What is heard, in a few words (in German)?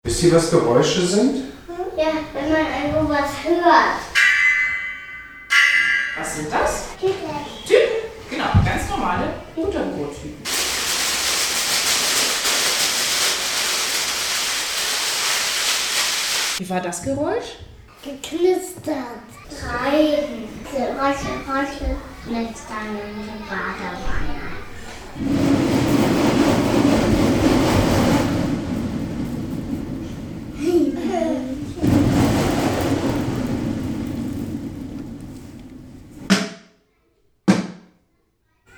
Experimentelles Musizieren in der Kita, Die Halbstarken